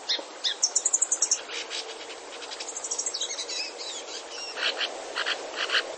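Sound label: singing in different voices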